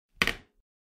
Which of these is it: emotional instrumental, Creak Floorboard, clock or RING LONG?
Creak Floorboard